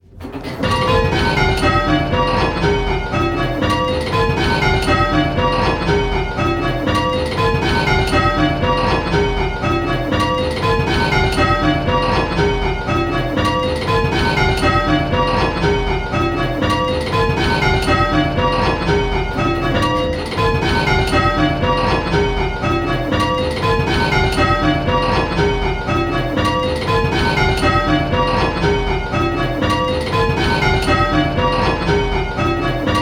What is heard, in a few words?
Striking 6-Bell Rounds; Pebworth